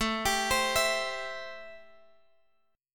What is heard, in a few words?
A-Minor 7th A Guitar Drop D